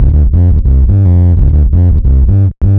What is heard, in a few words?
Mat Bass; AMG - Prototype Drum & Bass